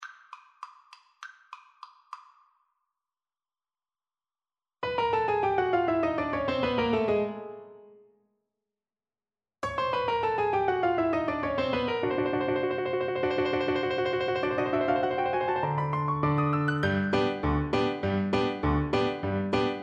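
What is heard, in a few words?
recorder karaoke; Fucik Entry of the Gladiators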